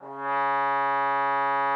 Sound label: trombone C#3; Xsample VOL-3